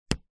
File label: stamp; The Witcher Hunt